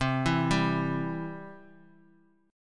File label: C Major; arpeggiated; JGuitar